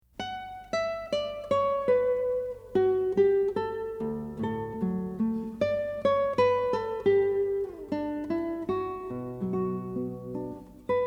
Concerto in D for Guitar & Orch

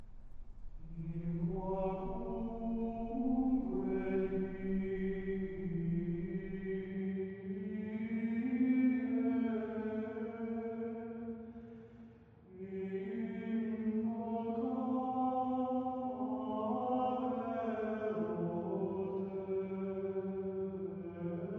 Gregorian Chant; In quacumque die

